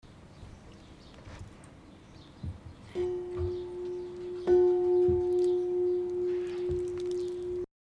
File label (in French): cloche; St Pierre le Moutier, cloche 2